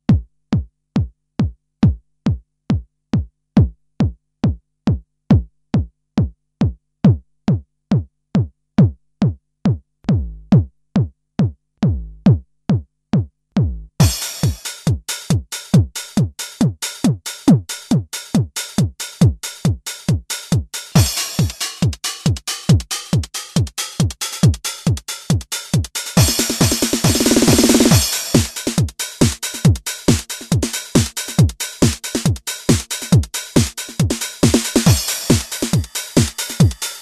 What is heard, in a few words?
TR-909 Test Loop, Roland TR-909; Synths